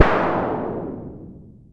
crunk shout, zaps and beeps

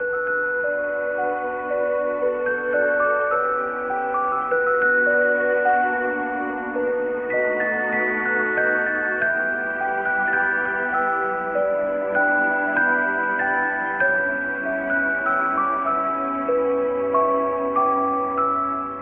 keys and strings, sad loops

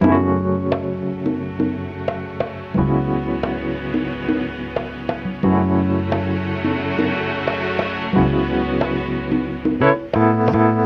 vibes and strings; Loops; Phonky

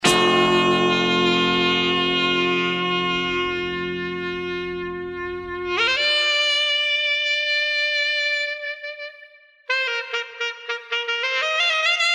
sax

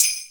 jingle bells

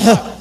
huh male, Voice

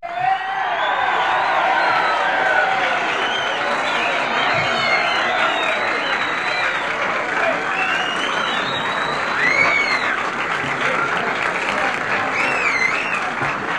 applause cheers

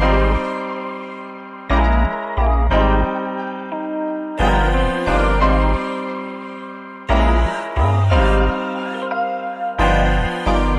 keys; Phonky

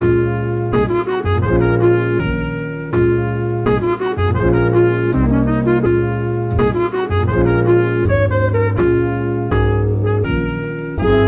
horns, Loops

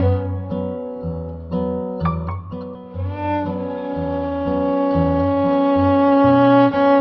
piano strings, sad loop; loops

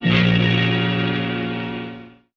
spooky, synths

